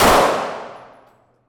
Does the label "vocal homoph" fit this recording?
No